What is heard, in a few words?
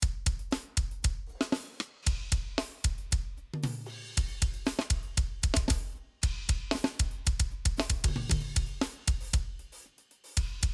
drum rhythm